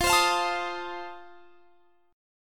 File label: F 7th F down; strummed